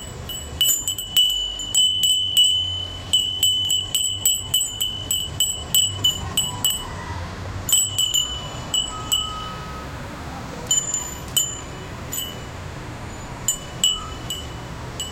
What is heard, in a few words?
distant Indian rickshaw b, india